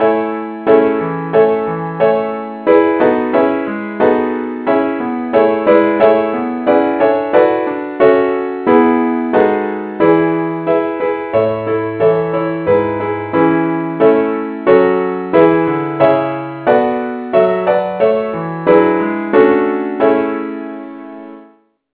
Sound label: keyboard key a; saintthomas